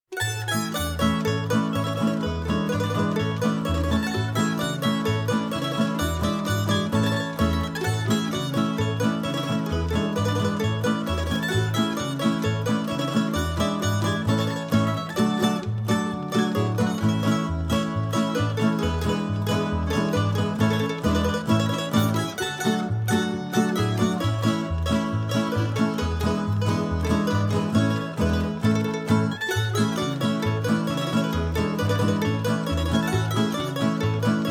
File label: Croatia, Prigorska Svadbena Polka; Mediterranean